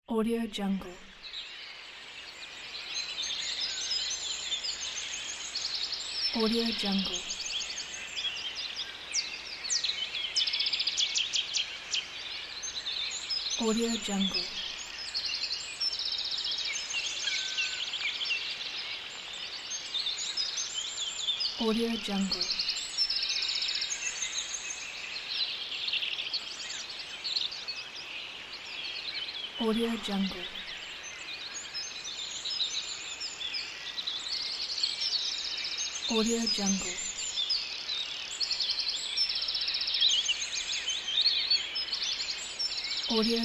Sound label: nature